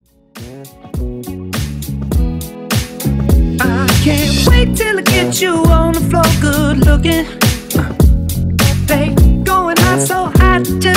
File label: Blend Extended 102 BPM; remixplanet